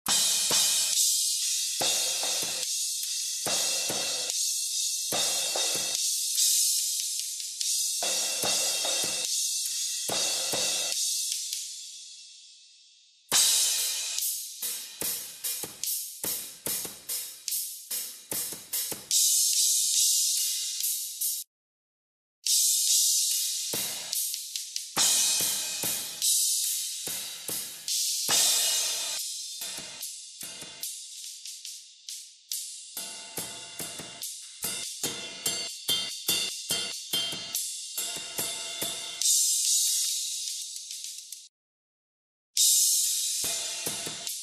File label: lowcut, Overheads